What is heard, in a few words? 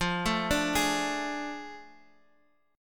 A Major 7th Suspended 4th Sharp 5th, chord